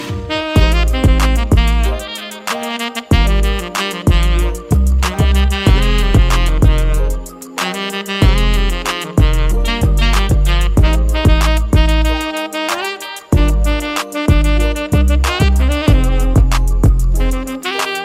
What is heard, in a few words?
Ringtone